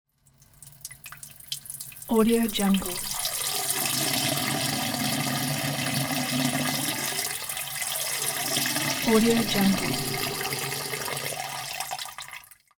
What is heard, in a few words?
male urinating in toilet